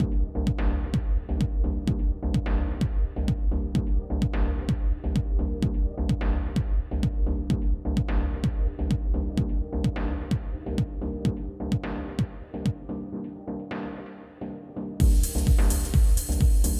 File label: Full Loop